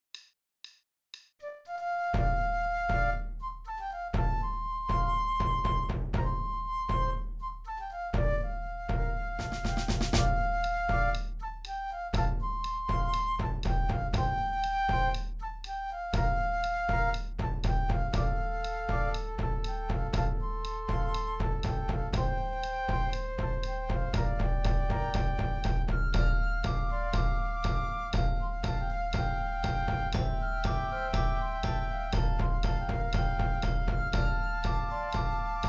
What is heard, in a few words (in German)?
Trommeln [120]